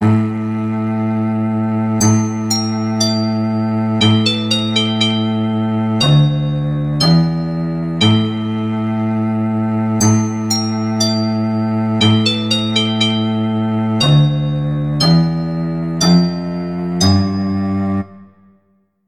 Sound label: Game over; MOG